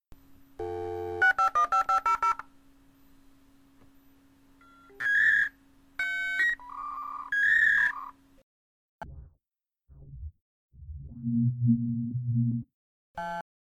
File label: dailfail